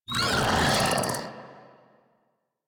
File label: Desert Skate Vocal Attack C; Warframe